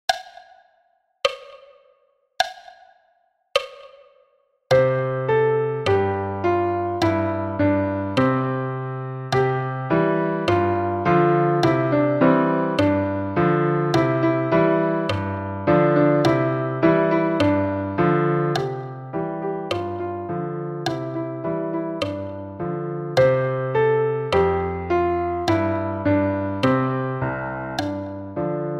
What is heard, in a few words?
52 bpm, percu